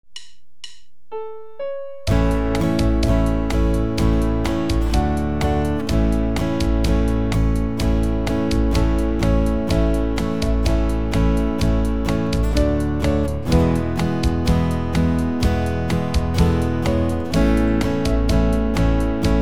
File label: Kumbaya, guitar